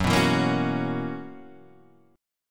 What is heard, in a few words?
B Major 7th Suspended 2nd Suspended 4th Gb